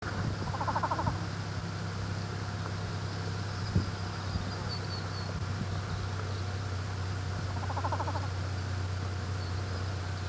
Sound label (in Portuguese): canto do socoi amarelo